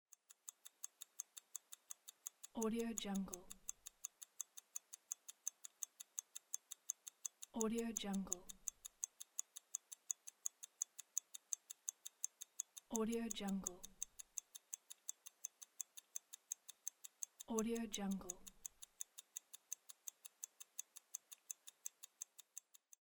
clock

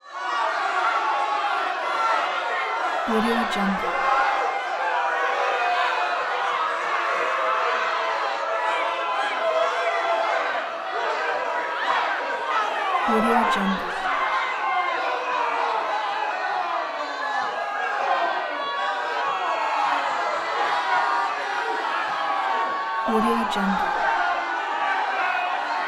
walla mob; prosoundfx

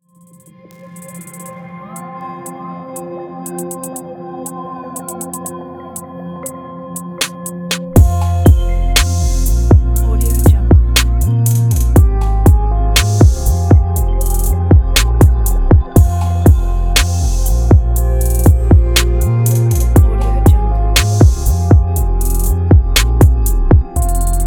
space ecstasy; azatbeatz

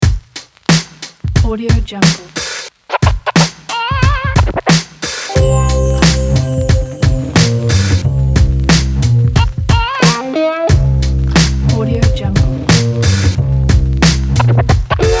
funky hip hop

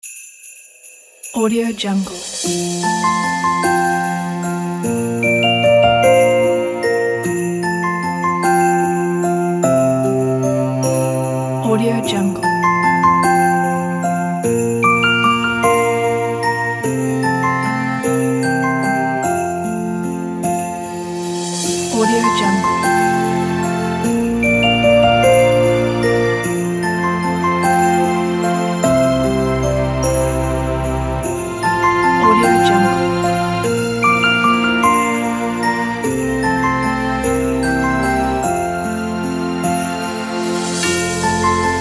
a christmas gift

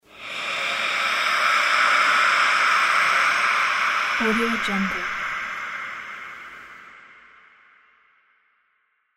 evil breath

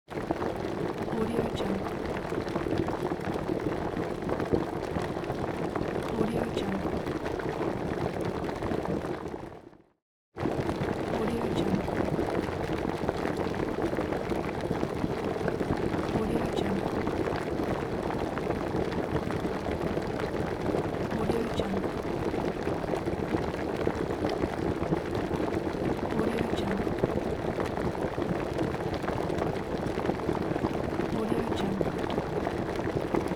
boiling

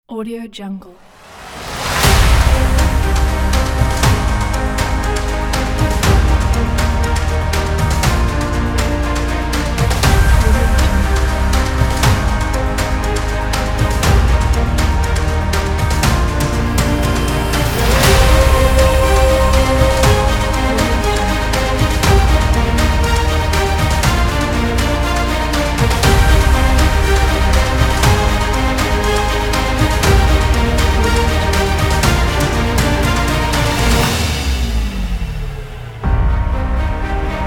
awards; smartsounds

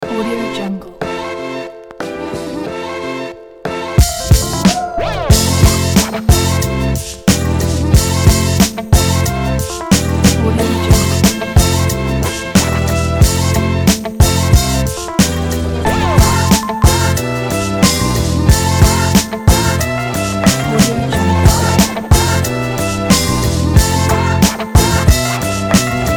hip hop

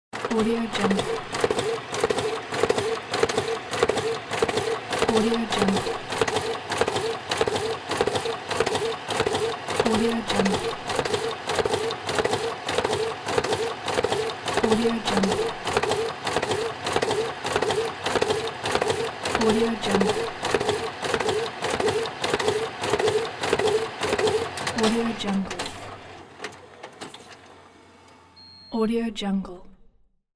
risograph sfx

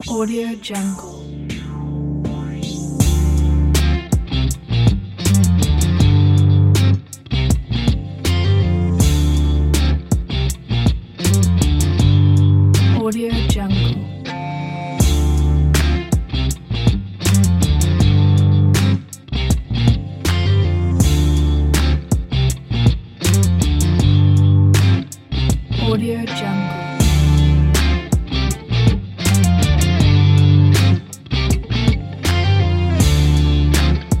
sexy hip hop; onkoff

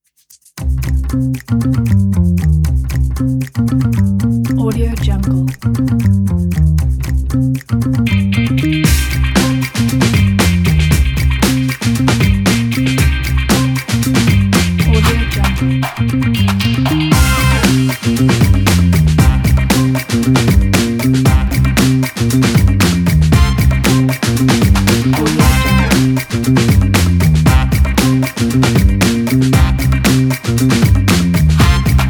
funk advertising groove

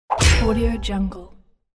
cartoon step on rake

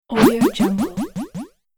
swipe jump fall

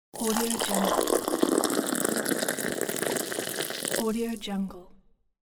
morning tea is poured into a cup

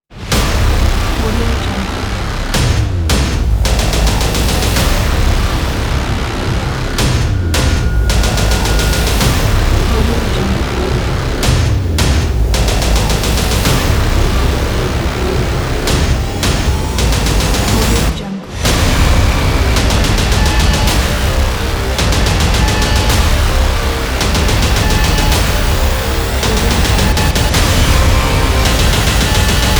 intense trailer

